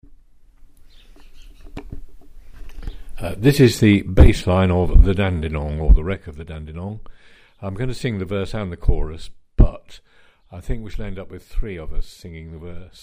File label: Bass; Dandenong